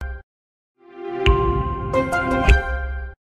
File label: intel jingle